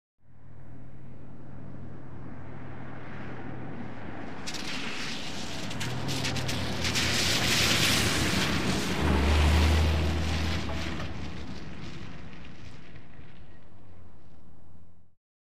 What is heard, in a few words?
Car Exterior Wet By